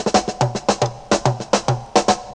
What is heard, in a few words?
Fills, Roland; Sound & Vision - Gigapack I; Perc